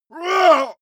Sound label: male groan strain